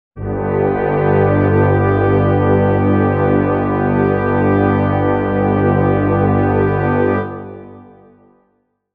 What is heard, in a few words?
brass chord g major